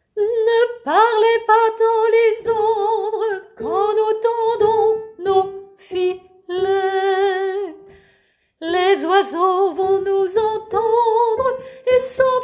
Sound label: tenor